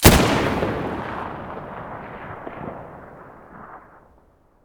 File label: muzzle M700 mono, weapons